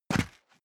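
step boots single concrete grit single stair up, footsteps; schritte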